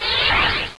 swoosh, cartoon

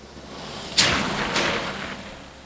splash, cars; transpo

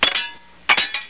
body hit

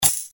button click; bob espo

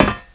grate, player; Group Mapping Project

